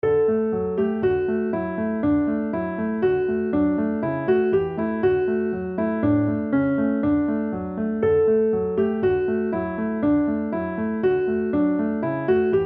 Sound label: Deck the Halls